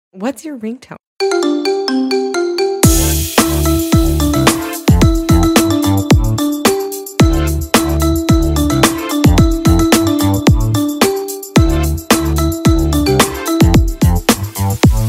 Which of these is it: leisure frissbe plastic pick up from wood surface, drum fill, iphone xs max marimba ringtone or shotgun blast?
iphone xs max marimba ringtone